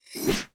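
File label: weapon infantry spear; Battle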